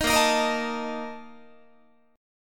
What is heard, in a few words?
Bb Major 7th Suspended 4th, Guitar